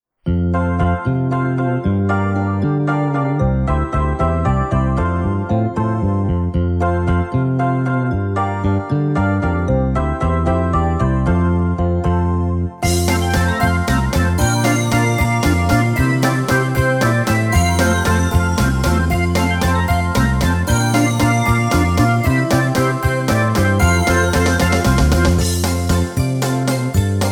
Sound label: Song (Instrumental); Spotlight 2